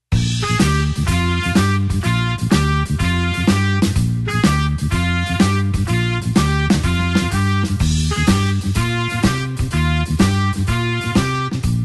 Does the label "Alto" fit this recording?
No